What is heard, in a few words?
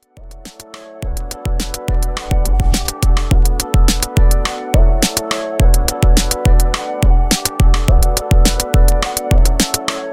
105 Bpm; Redrum